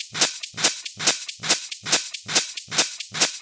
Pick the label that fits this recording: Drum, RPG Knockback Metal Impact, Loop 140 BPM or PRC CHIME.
Loop 140 BPM